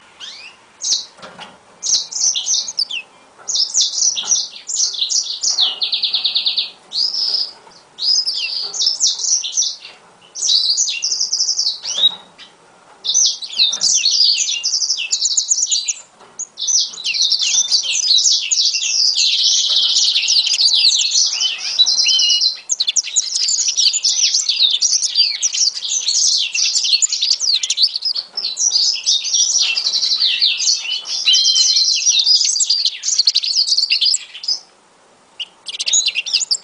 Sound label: african citril